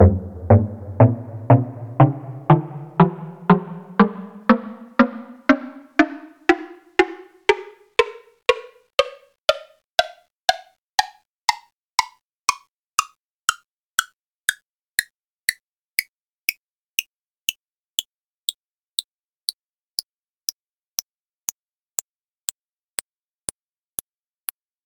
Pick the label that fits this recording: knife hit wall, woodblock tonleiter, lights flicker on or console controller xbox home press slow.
woodblock tonleiter